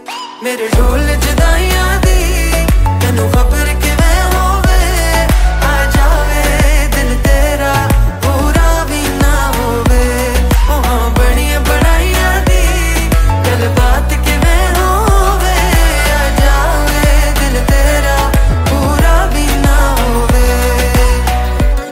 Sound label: Female Ringtone